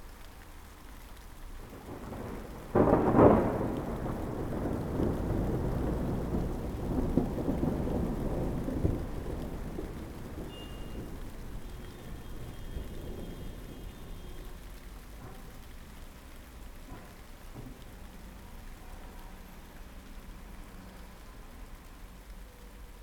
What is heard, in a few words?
distant thunder; 99Sounds; Rain And Thunder